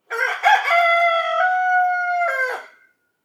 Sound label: fun rooster